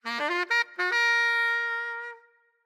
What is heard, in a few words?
Jazz Charge, notifications; Samsung; Galaxy A56; Fun